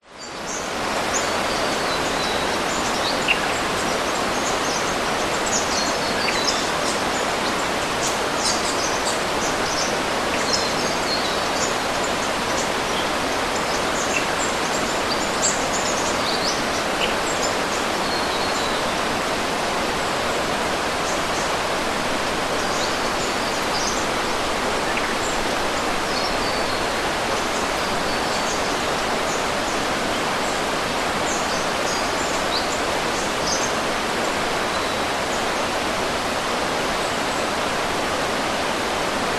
nature forest ambience distant creek birds close australia; zapsplat